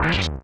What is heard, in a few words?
Enemy hurt; mother2